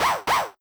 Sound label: dog bark synth